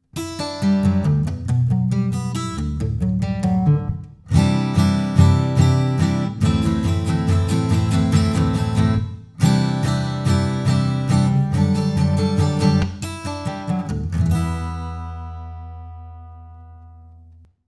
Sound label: Acoustic Attak